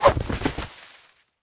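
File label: Nu Drum Old Bass Short Rhythm; smite; kuzenbo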